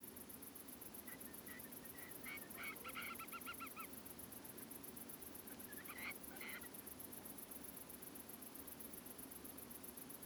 Whimbrel screechy calls with Tree Pipit Portland

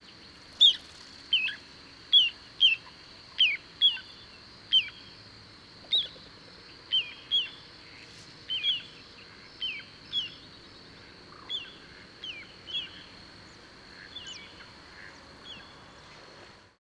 Downward Inflected Calls

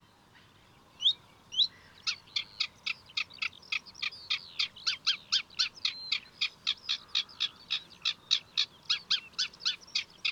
Song

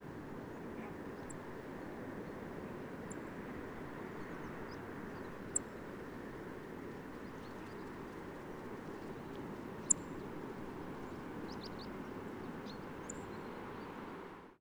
Song Thrush Flight Calls Of Migrant

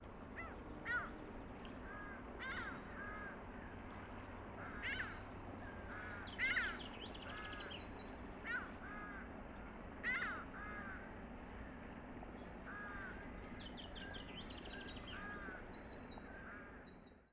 Mediterranean Gull Typical Adult Calls In Flight

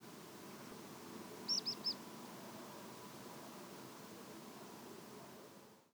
3 note nfc

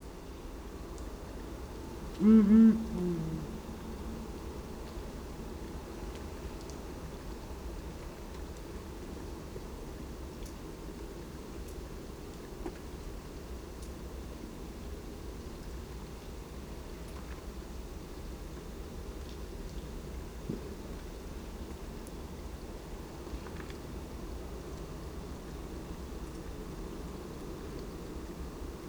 two duets

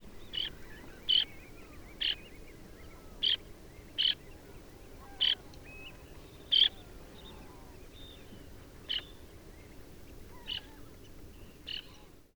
flight juv

